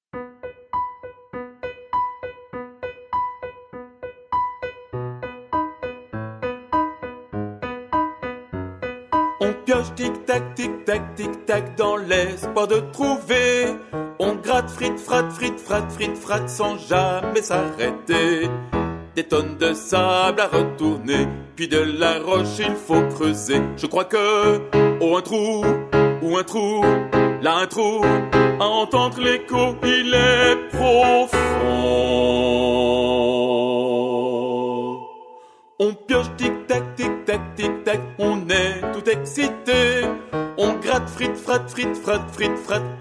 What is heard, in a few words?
BARYTON